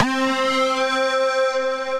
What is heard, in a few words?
Creeper Lead, Synth Lead